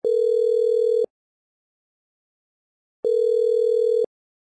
Korea ringback tone

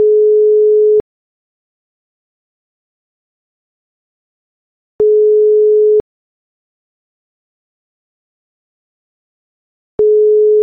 European ETSI ringback tone

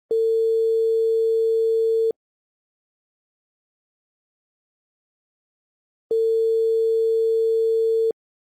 Turkey ringback tone

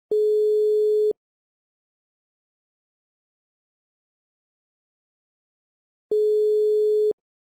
Portugal ringback tone

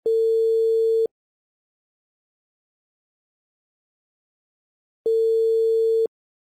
China ringback tone

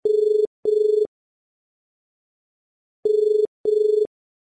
Singapore ringback tone

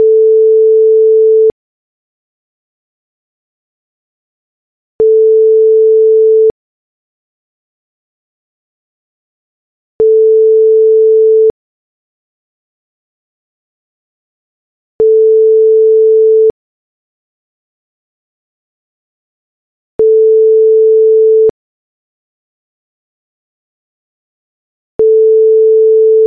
France ringback tone

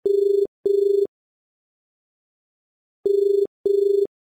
India ringback tone

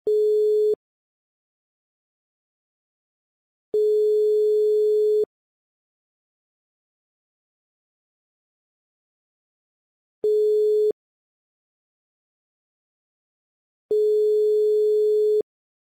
Kenya ringback tone

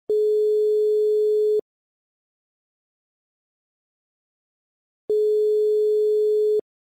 Algeria ringback tone

